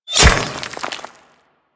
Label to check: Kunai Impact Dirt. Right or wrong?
Right